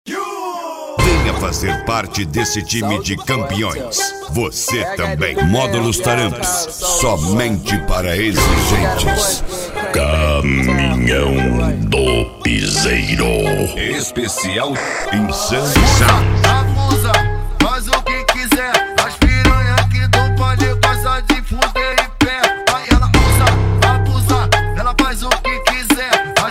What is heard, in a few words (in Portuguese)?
caminhao do piseiro especial insane sound